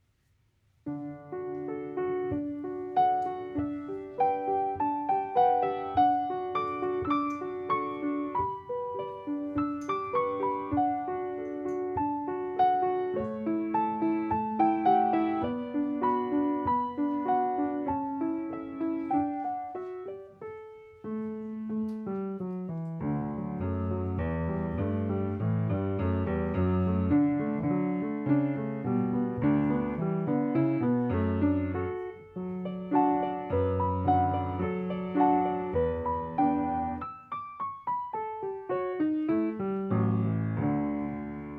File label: Piano; concerto della terra 2026